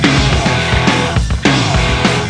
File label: Loop